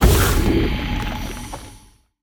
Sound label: recall dive; smite; poseidon